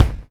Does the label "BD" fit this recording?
Yes